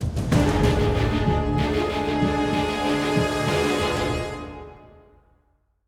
190bpm; Legends of Pegasus; windows gamerip 2012

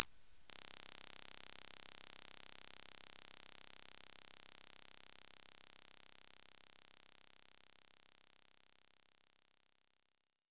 DX7 Strike

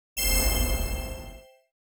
Revive heal

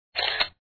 Snapshot; papas pastaria to go; android

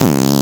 Fart

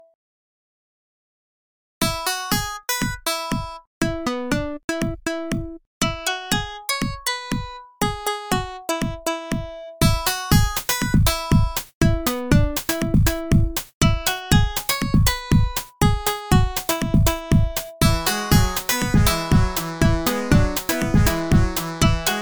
treat beat; princess castle quest windows gamerip 2021

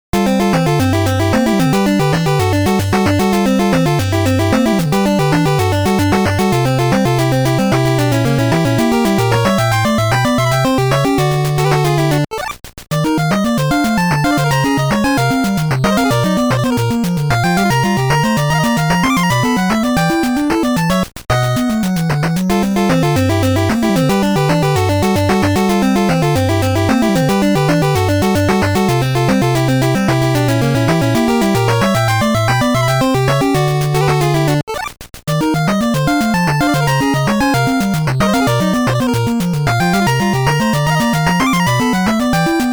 Stage Music; Armadillo; NES

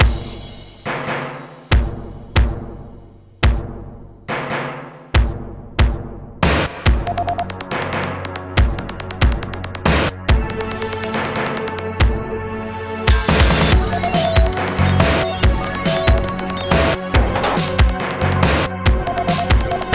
bgm mecha battle middle boss

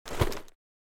Equip

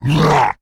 Creatures Fight Attack; mighty party 2017 gamerip pc ios android

